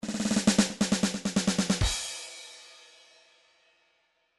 trance midi loops fills; Newtronic Trance Constuction Kit; bend over

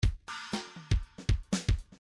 midi grooves funk

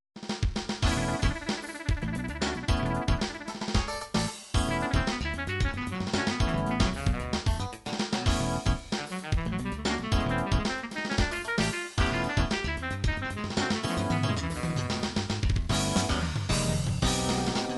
jazz free sax, midi

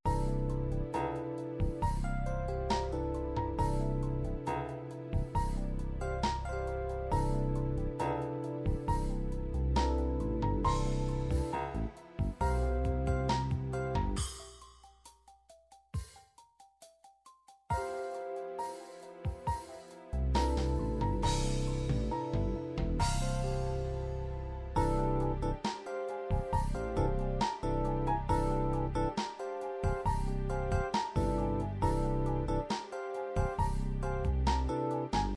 funk fusion ballad, midi

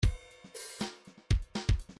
bdf midi file grooves funk a funk a

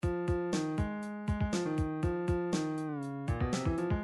midi drums heavy 8

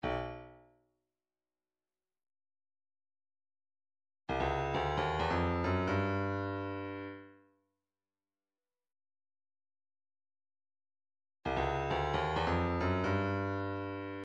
cowbell, midi

